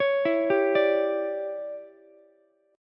D Major 7th Suspended 2nd Suspended 4th D down Ukulele; DM7sus2sus4; arpeggiated; JGuitar